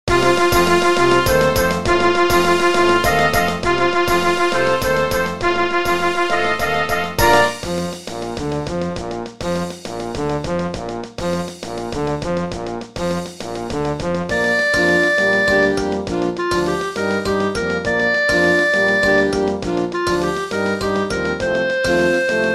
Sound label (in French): Ambiance Caraibes; midi